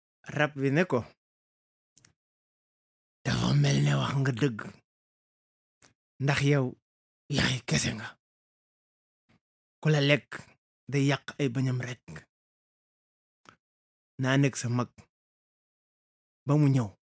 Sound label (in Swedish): bip